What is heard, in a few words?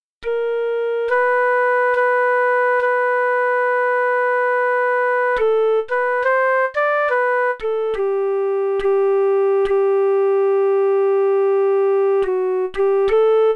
midi